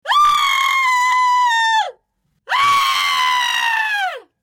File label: screams woman